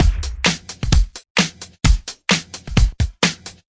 130 bpm funky lil bitch full, drum loops and breaks